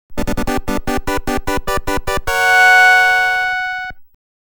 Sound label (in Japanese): ステージクリア; 謎のディスクマガジン ナゾラーランド第2号; 爆風トモちゃん